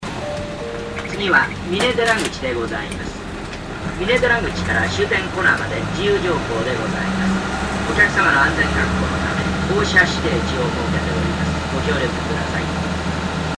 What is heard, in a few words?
bus tape orgel; kansai; narakotsu